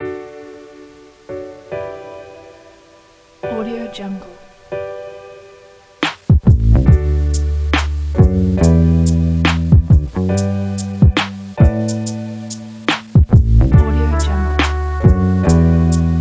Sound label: uplifting lofi hip hop